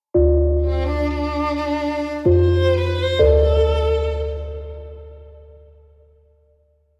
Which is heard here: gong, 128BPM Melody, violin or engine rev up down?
violin